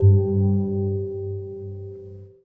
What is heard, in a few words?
Gong; Sonic Foundry (Sony Creative Software) - Friendly Fire; Single Gongs